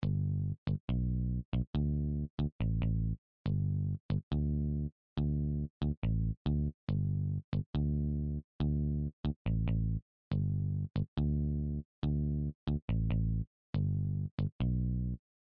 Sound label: Kwela Bass